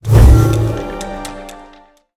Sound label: dash; smite; khepri